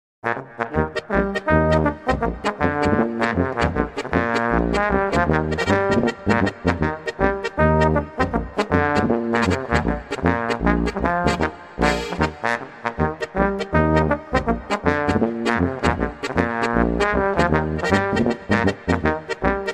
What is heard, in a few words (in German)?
schneidiger bua polka